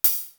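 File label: hi-hat; East West; Global Sounds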